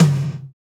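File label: tom deep, acoustic toms; Roland - Rhythm Section; Real Toms 1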